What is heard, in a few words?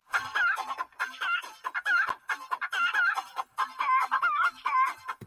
oof chicken